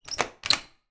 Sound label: household key mortice in door turn